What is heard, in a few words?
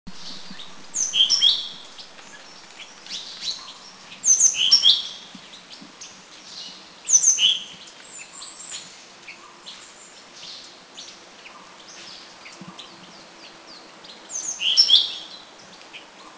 Yellow-cheeked Tit; Thailand